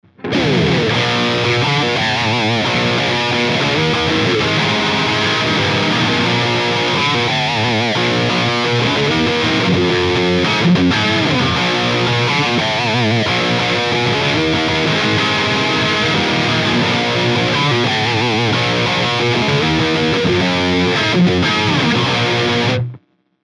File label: S Prestige Heavy, guitars; s6570q prestige